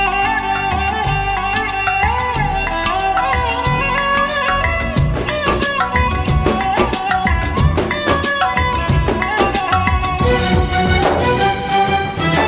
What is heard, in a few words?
love romantic music ringtone; bollywood ringtones